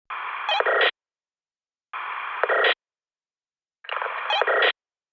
Walkie Talkie Beep With Noise Dispatch Signal Artificial